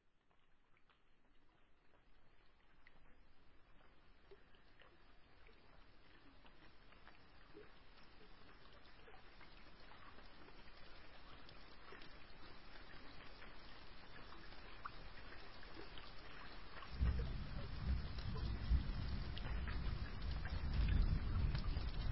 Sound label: Gentle Rain; relax